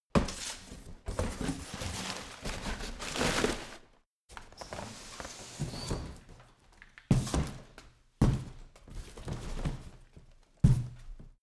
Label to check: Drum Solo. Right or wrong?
Wrong